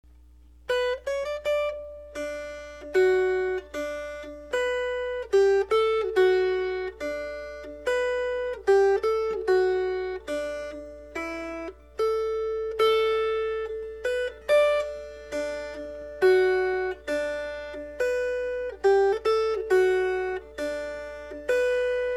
Melody

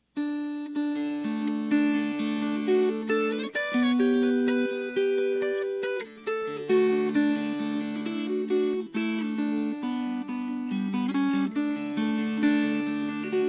Fingerpicked

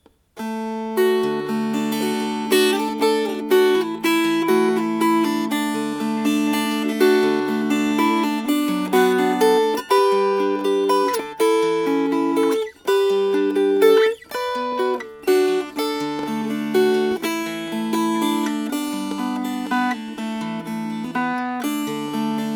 Fingerpicked

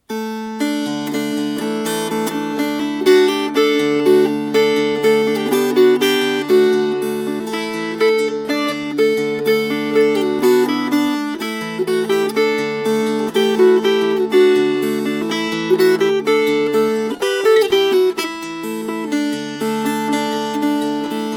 Fingerpicked; Dives and Lazarus

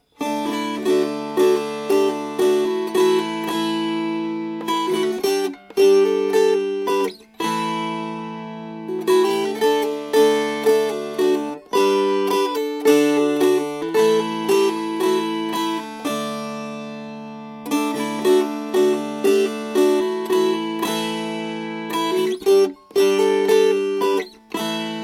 Strummed; Married to a Mermaid

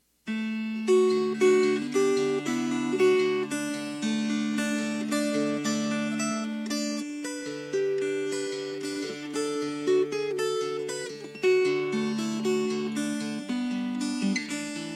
Fingerpicked

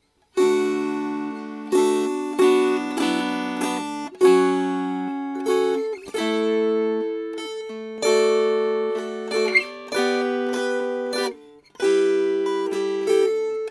Strummed; Morag of Dunvegan

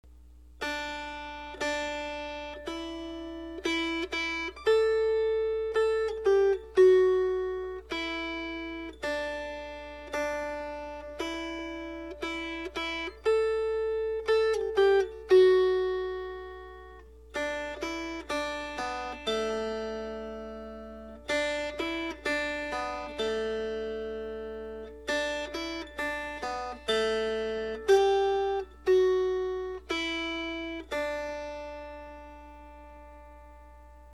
Melody

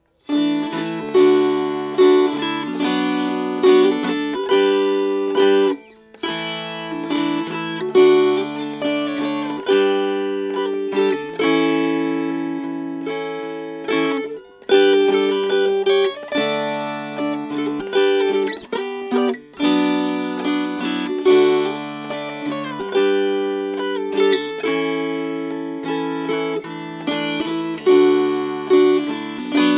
Now Nature Hangs Her Mantle Green Strummed

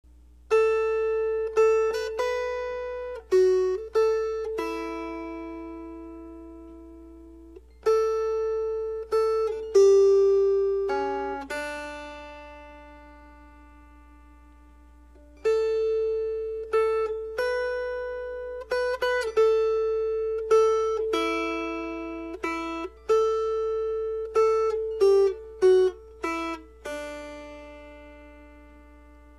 Tommy Melody

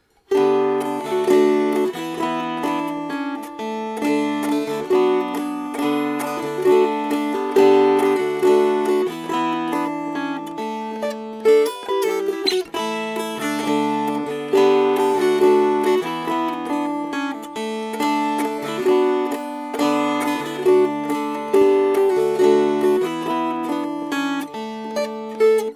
Strummed; Miss Jorgensens Rigadon

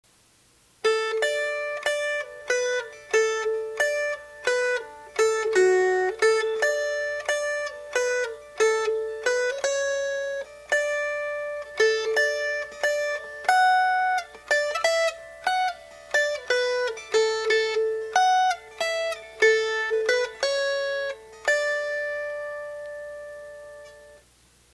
Melody; Kind Miss

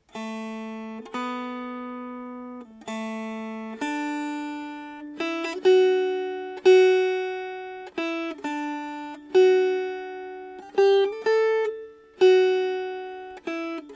Melody

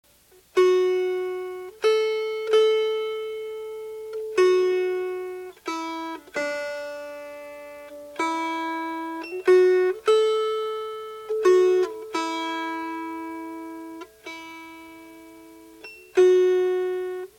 Melody

